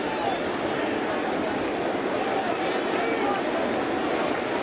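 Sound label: baseball crowd ambience loop; Crowds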